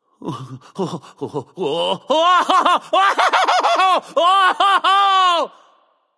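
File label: non verbal fear, voice; cernunnos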